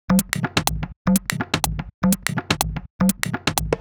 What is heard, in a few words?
Top G# 124bpm, House Drumloops; Zero-G House Fabrik Drumloops